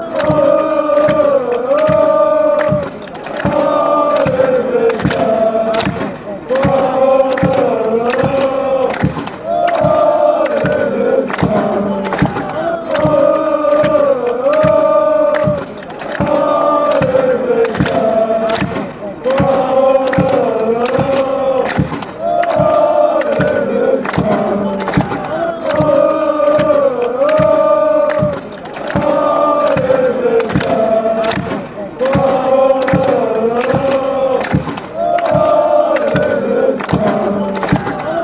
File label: allez, fan chants; messains